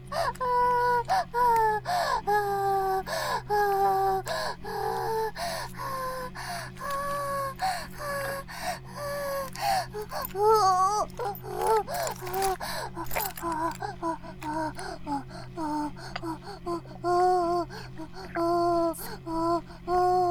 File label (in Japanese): バイブ放置, ASMR